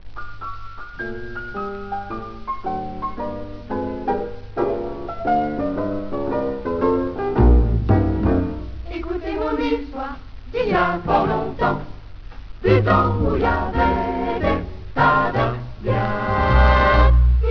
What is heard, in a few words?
boo